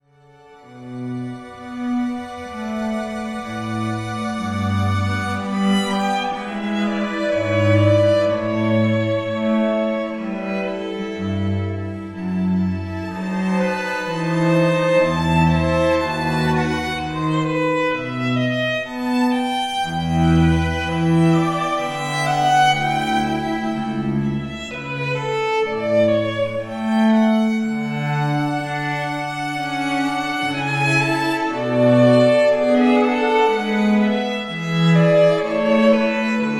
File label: String Quartet; Bach Air